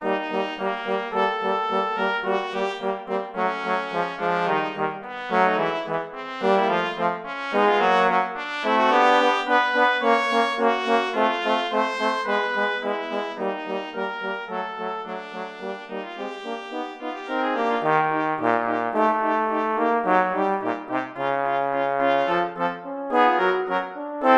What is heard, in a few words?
Brass Trio; Brass Trio 2 Mvt I